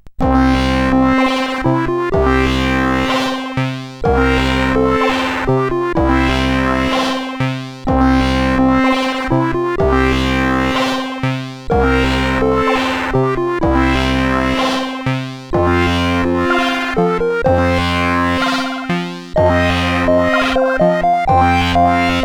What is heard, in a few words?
CSG8580